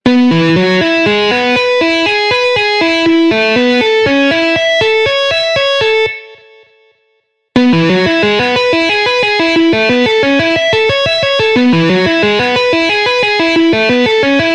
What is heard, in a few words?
tapping sound, guitar